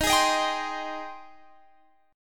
Ab Major 7th Eb

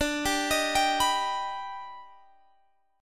D sharp Augmented Major 7th D, chord

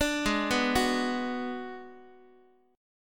G sharp Minor 7th Flat 5th, Guitar; arpeggiated; x,x,5,6,3,5; 4A,4E,5A,5D,5G#,6C#

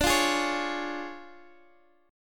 C sharp Major D; strummed; JGuitar

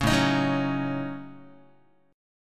A 6th Flat 5th A Guitar, chord; A6b5; strummed; JGuitar

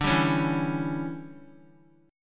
D Major 7th Suspended 2nd Suspended 4th D, Guitar; DM7sus2sus4 strummed

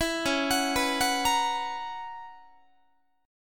Db Minor 13th Db Guitar; Dbm13 arpeggiated; JGuitar

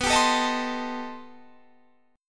B Augmented 7th Flat 9th B; B+7b9; strummed; +7b9:1,3,sharp5,b7,b9; 19,22,19,20,22,20

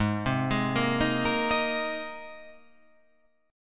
Ab Major Add 9th, Abadd9 chord; Abadd9 arpeggiated; JGuitar

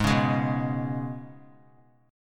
Ab Minor Double Flat 5th down; Abmbb5; strummed

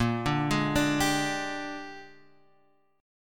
Bb 13th Bb down Guitar Drop D; Bb13 arpeggiated

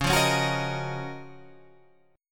F sharp Major 13th C sharp

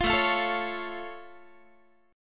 A Augmented A down Guitar; A+ strummed; 20,17,20,19,17,x

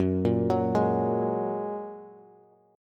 Gb Major Add 9th, down, Bass; arpeggiated; JGuitar

